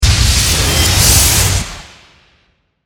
Force Power Burst, Force, Power, Burst, Break, Suction